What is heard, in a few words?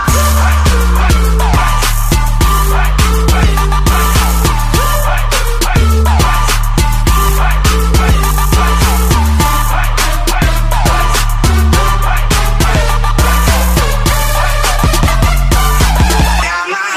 Overdrive; Gecko